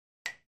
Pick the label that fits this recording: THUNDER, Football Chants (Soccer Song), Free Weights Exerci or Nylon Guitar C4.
Free Weights Exerci